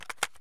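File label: ak magazine in fail; Escape from Tarkov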